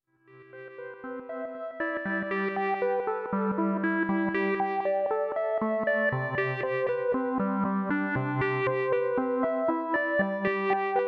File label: light seq echo, synth; uhbik; analogsynth